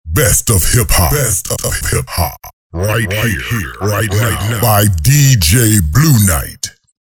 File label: Hip Hop without Effect